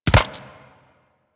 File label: Arachnoid Micro Land; Warframe; Corpus